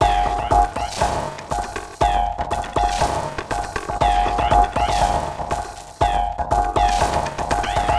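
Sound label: Loop, beats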